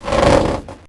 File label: Dendroid Guard Defend Sound